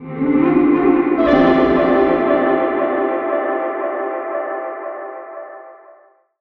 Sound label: vocal awakening Dm; Slate; AURORA Sample Pack; Vocals